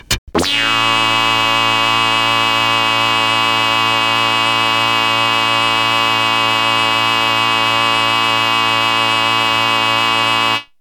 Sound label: breaks; Super Duck Breaks